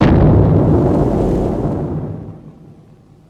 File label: Footstep; Shin Godzilla